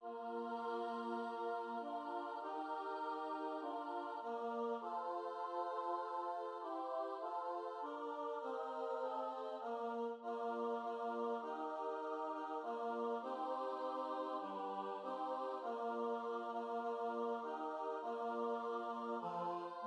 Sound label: Bass; O Beatum Et Sacrosanctum Diem; Practice Room